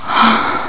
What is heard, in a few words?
GROAN; psion cd vol1